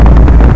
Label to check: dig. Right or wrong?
Right